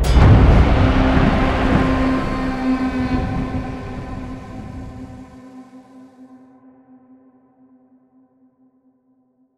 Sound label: freespin intro; netent